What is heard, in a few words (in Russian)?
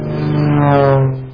zvuk padayushego samoleta